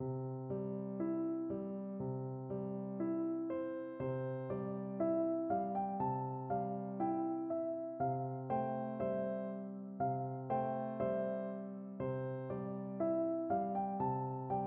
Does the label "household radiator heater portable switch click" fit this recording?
No